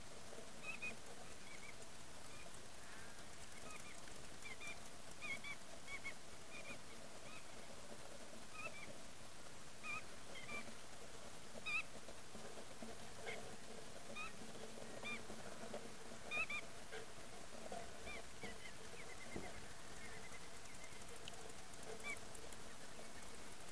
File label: Bar tailed Godwit